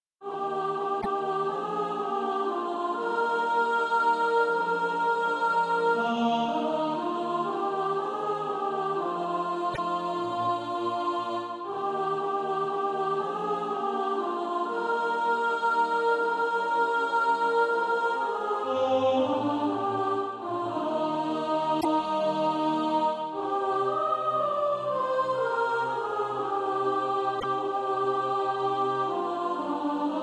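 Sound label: soprano, SATB Practice Music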